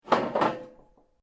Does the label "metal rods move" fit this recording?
Yes